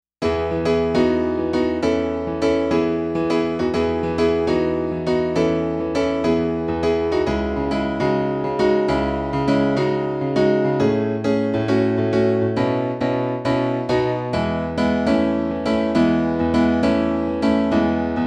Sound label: juno-di pop piano